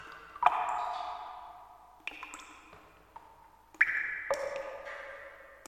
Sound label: Water Dripping Loop; Silent Hill Downpour